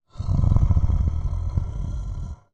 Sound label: monster growl, breathing